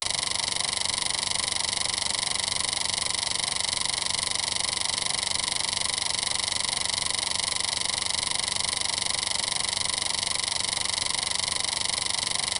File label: security camera rotating